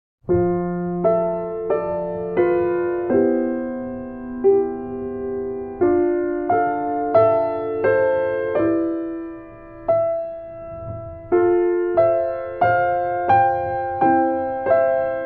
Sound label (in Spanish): orquestal emocional